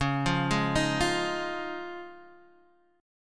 D sharp 7th Suspended 2nd Suspended 4th C sharp, Guitar